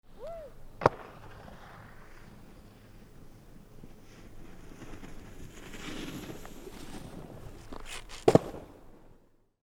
snowboarding

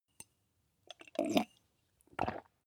tea drink

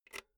insert jack cable quick

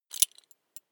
metal belt buckle clatter heavy

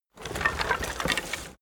bones rustling movement

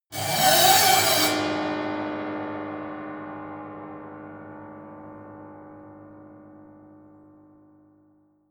piano bow string broken

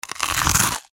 eating chew crisp fat

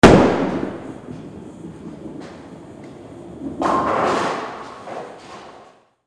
bowling ball hit hard

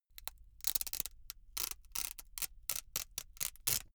velcro large open really slow

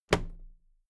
toolbox close drop lid soft

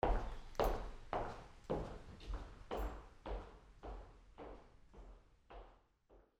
steps on parquet floor

